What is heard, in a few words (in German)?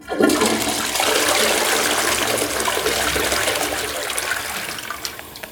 Toilettenspuelung; Geraeuschequiz